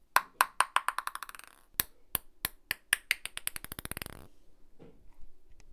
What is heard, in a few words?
plastic ball falling on a wooden floor